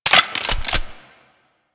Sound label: Double Barrel Shotgun Fire Mech; Weapons